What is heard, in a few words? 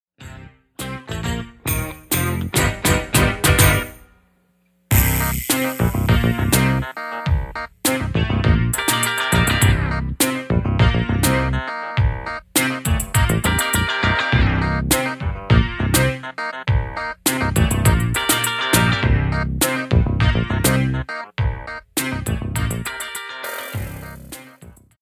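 Celebrate instrumental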